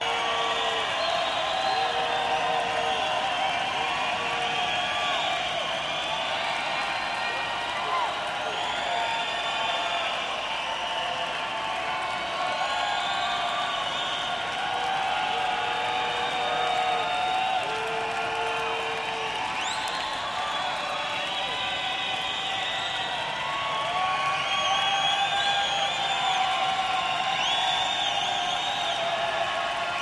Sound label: Crowd Noise